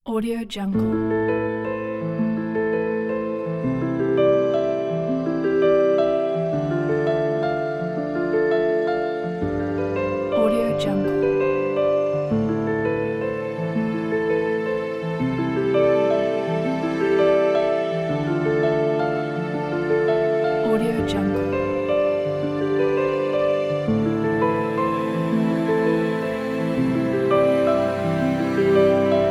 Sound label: emotion presentation; sanibel-audio